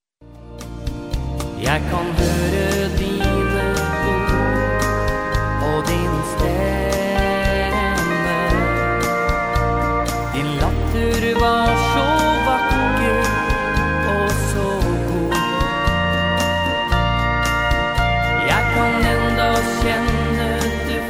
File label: alt steel mix